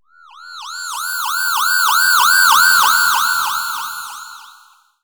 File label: Reverse FX